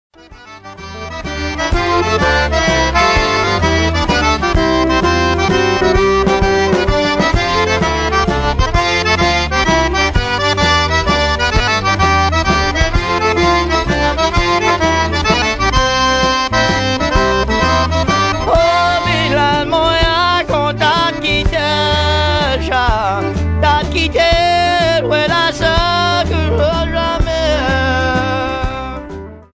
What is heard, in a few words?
Cajun Waltz